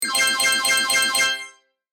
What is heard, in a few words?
Casino slot machine medium reward prize